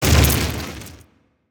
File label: Fire bolt; SNKRX